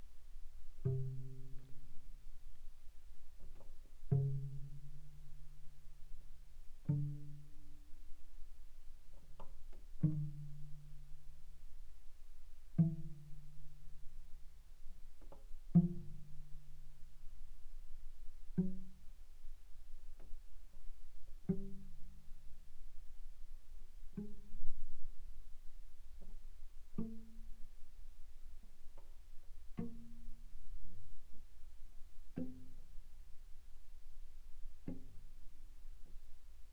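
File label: Cello pizz pp sul C C3 C4 stereo, pizzicato; Strings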